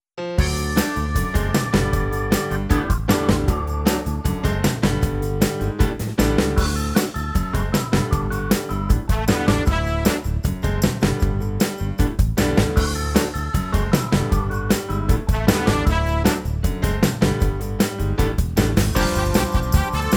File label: Come To The Manger Backing